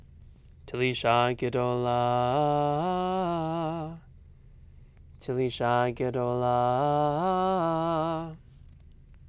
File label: telisha gedola; Trope Families; trope exercises